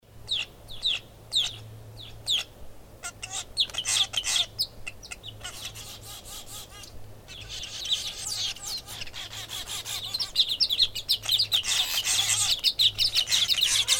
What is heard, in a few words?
Raw field recording Weaver birds Egyptian geese; James Webb; Citrusdal